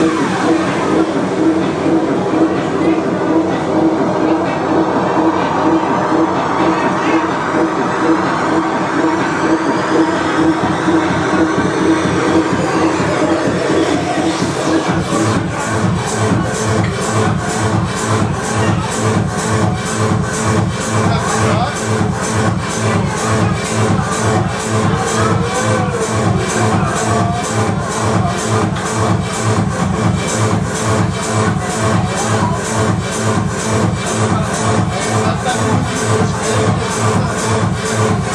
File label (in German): techno aus kreuzberg; saal 9